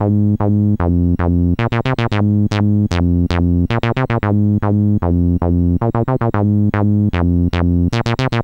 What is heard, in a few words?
C64 Bass, Commodore 64, Chiptune